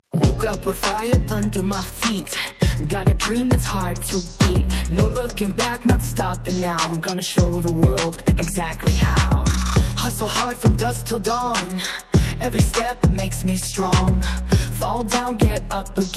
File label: hip hop vocals